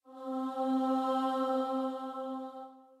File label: starting note, voice; moderato